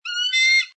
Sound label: American Bald Eagle Squawk, Bird of prey: American bald eagle sound, single squawk